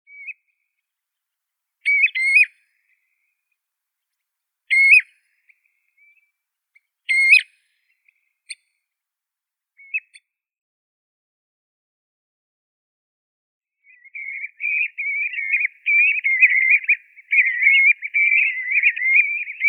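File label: common ringed plover, Charadrius hiaticula; galstuchnik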